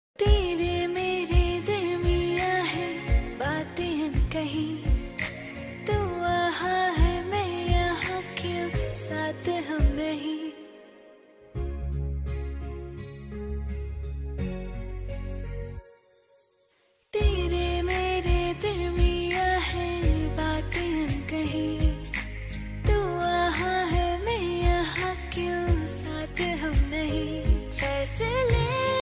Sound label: new hindi song ringtone